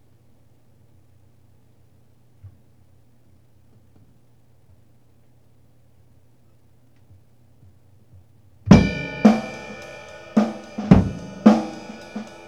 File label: simple funk ride soft 110, acoustic